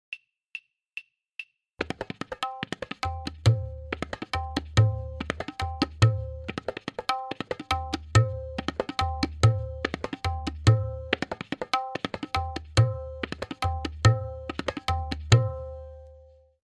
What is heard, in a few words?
Click; Simple Chakradar